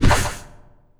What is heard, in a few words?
Jump